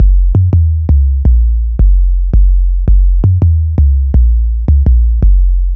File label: 808 Standard, Bass Lines 166.5; Jungle; Zero-G Jungle Warfare 1, 2 & 3; 90 s Sample CDs